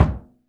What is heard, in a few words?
CEREMON, LRG DRUMS; Spectrasonics; Heart of Africa